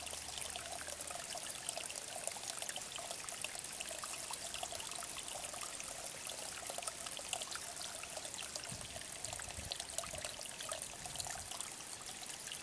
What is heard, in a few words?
trickling brook; at osage hills